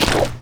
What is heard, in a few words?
fs fm paint, footsteps; player; Portal 2